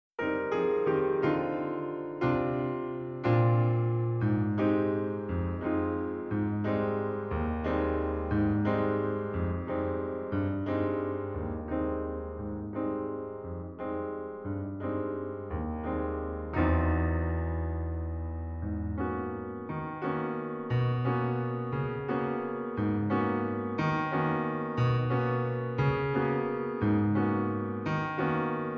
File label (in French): accompagnement